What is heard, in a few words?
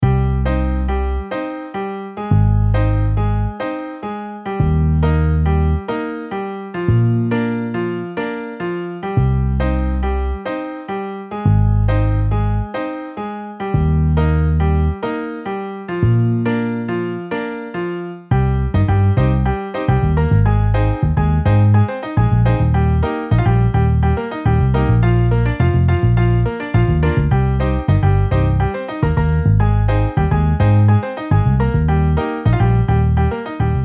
Piano Bass MIDI